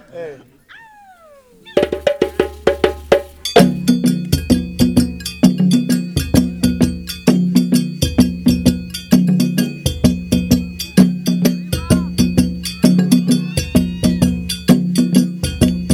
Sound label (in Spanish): Tambores; mexico 2005